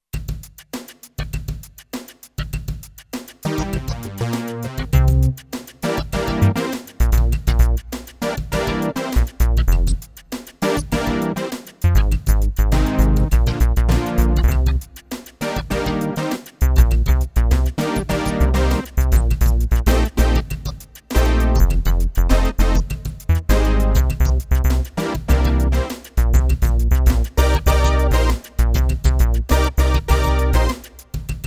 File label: C2 Jam, Kurzweil K2500X